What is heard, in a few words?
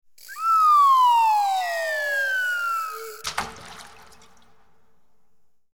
Cartoon whistle splash, Cartoon -Slide Whistle Down And Splash; Reverberant Slide Whistle Glissando Down With Ratchet To Plunky Splash; Boings